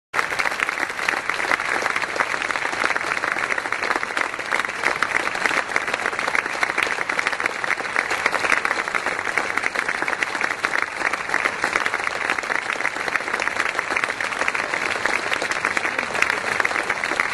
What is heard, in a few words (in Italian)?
Applausi